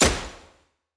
Gun Shot; SoundsCrate

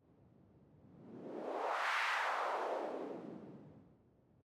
wooshing wind

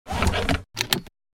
seatbelt do up

